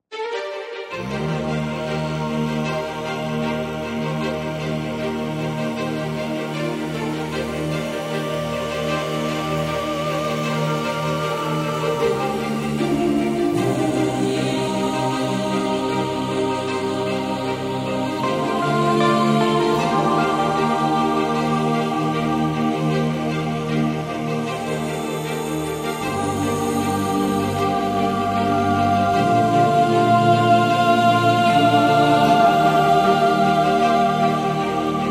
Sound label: Tone Break Daydream